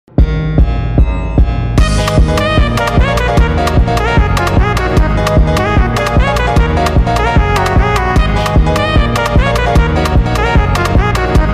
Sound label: type beat speed up